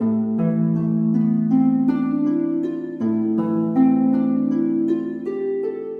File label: Beautiful Harp